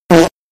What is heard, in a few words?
fart